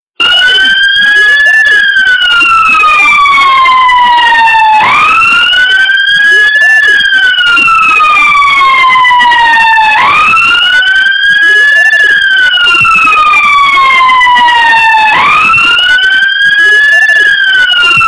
Ambulance

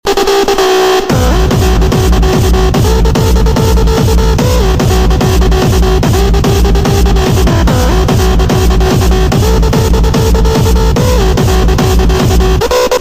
New Techno

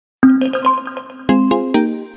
Jingle; Nantes

